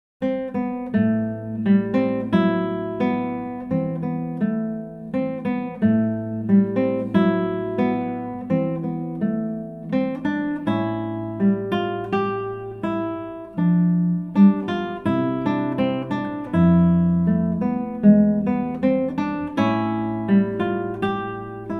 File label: Villancico Catalan